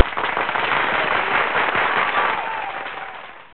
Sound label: Applaud